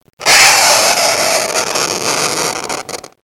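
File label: chalkboard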